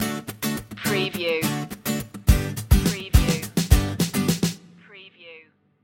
coffee break stinger

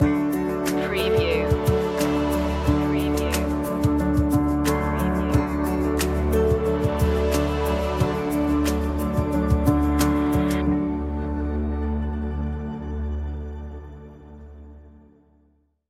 inspiring beauty

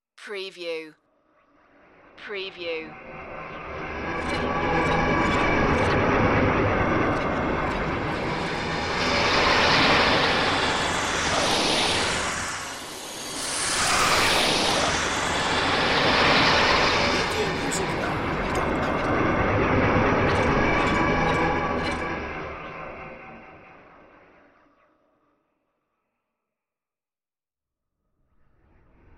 time glitch flybys

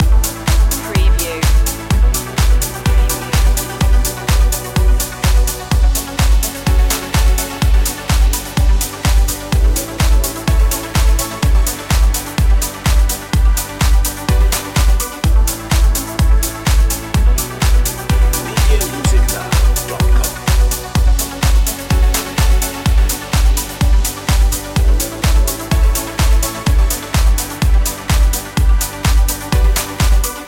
rhythm changer loop

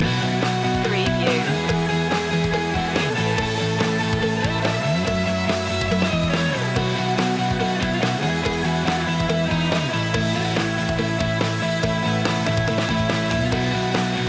celebrate loop

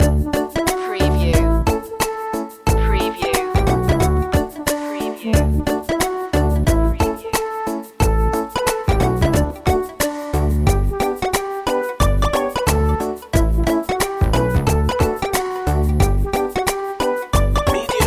retro video game labyrinth long looping

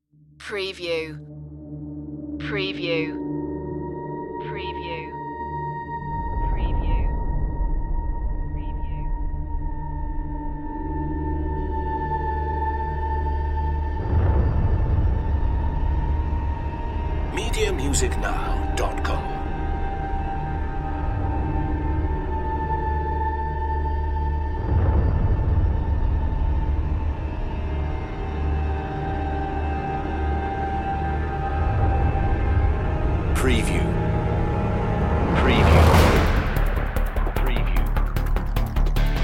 underworld series dramatic cue

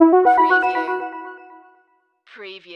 Multimedia Chime

